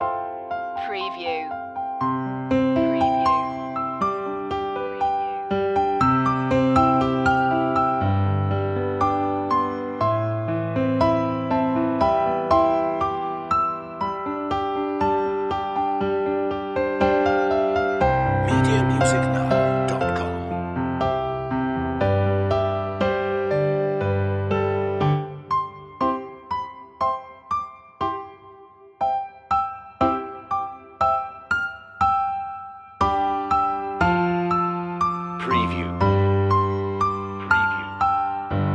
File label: o come all ye faithful piano; media music now